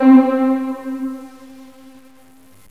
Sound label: Brightness, Synth SFX; GMPlay